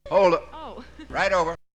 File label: Vocal; ZERO G - Datafile DISC 1